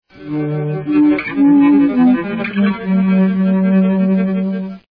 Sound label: wood